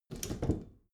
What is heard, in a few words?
bathroom cabinet hinge scratch; objekte; tueren